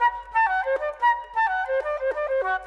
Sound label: Flute; Sonic Foundry (Sony Creative Software); Orchestral VOL-2; Modern 07